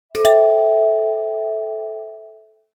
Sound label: office; message tones